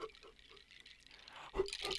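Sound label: Music Village Calm Near; From Dust; ps3; xbox 360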